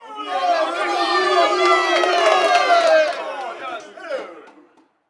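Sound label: Street Ball Crowd Jungle Encourage, Crowds; SFX Kit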